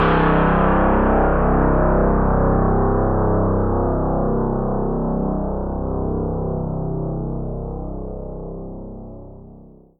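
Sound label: sharp low sound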